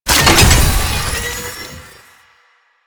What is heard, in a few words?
Research Pod Start; Warframe; Jade Shadows; Ascension